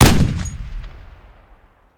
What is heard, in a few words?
Gun, Machine, Browning, Single Shot; gun and weapon sounds; captain audio; fully loaded